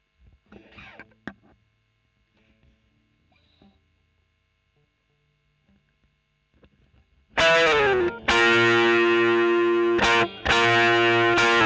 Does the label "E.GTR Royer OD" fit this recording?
Yes